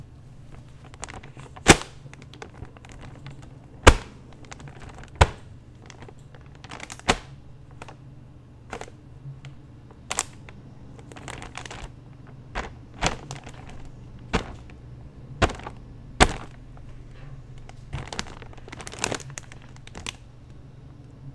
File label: plastic Bag; ludumdare34